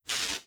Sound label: reality breach spark; Stellaris; nemesis